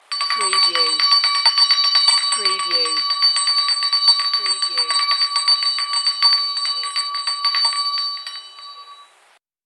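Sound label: Small School Hand Bell Vintage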